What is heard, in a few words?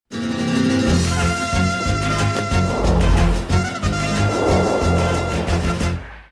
flamenco riff